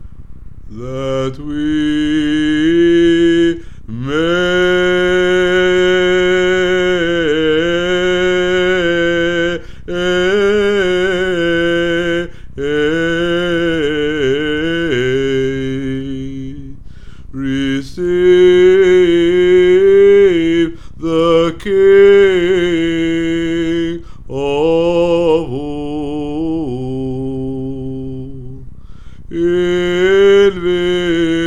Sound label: VOICE hymn tone 3; The Cherubic hymn